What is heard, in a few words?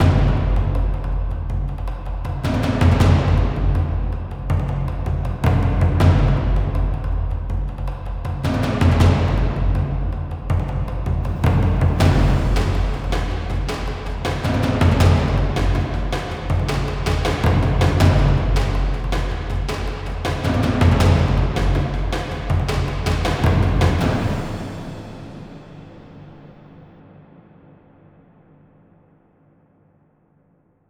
Battle Warrior Drums Sting; rambo the video game 2014